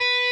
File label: Arp.